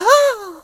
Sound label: surprised; Scrat